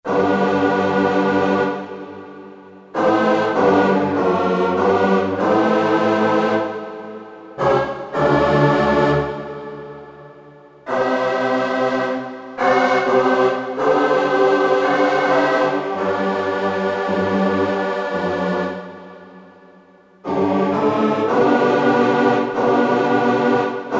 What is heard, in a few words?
Voices, Korg DSS-1; XLGGrp 1